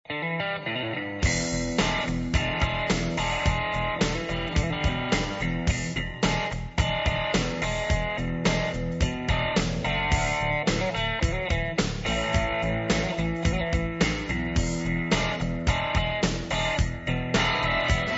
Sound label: bar band